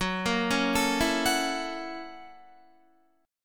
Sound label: F sharp 7th Sharp 9th, Guitar; arpeggiated; JGuitar